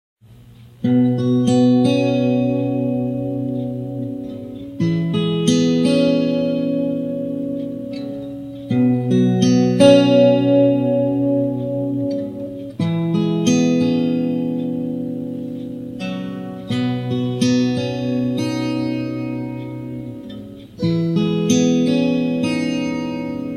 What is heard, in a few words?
Guitar Instrumentals